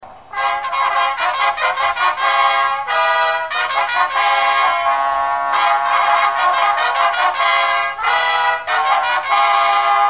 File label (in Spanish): Fanfarrias